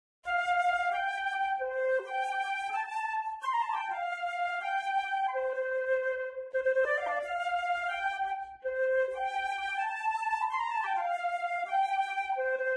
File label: Flute; Zomboid; Lifestyle